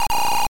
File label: Breaking; Sonic